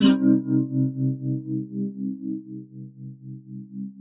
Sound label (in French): Guitare echo